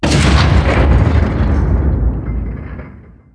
floodgate stop; ambient; machines

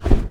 bird flap; npc; Portal 2

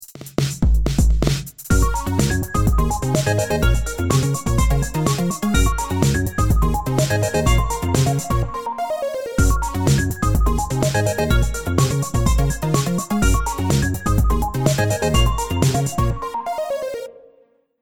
start action, music

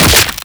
host abandon pop, headcrab reviver; creatures

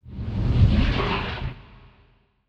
water lap; ambient; Counter Strike Model Pack; CS:GO; nuke

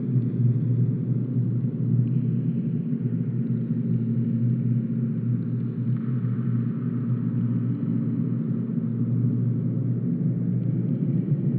drone interior; aztec2

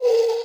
move, hinges drawers; tictac

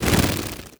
buzz soft, antlion wings; creatures

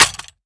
weapon smg impact; physics

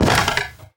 fs fm plywood, footsteps; player; Portal 2

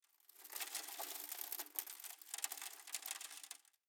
sand auto; ambient; dust2

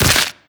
grab contact, barnacle creatures; Half Life Alyx

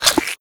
breath out, headcrab black; creatures

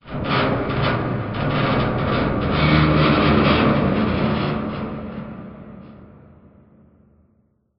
amb apocalypse wronk, ambient city

